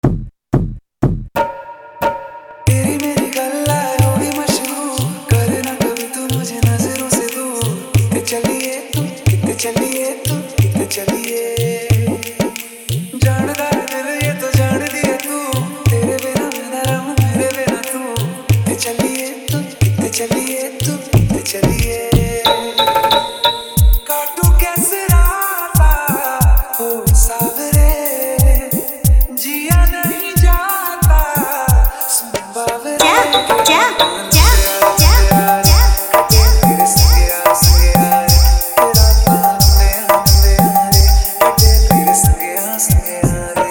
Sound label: Dance Bass Remix; Bullet Proof